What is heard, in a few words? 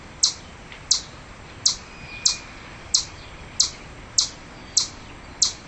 Chipmunk, Tamias; Active: Spring; Mammals